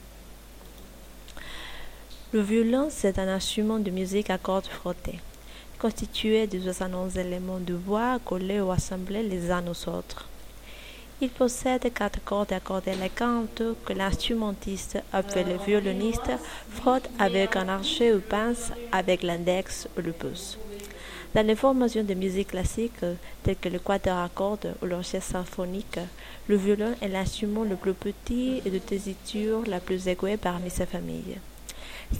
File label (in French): le violon; FRANCES